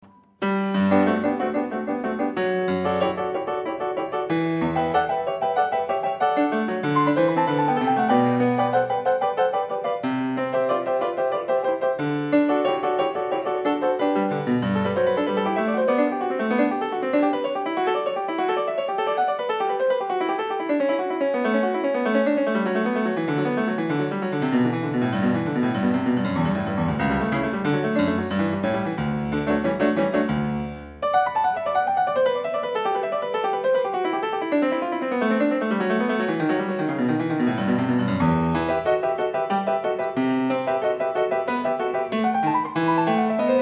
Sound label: Bach Goldberg Variations a 1 ovvero 2 Clav, PIANISTS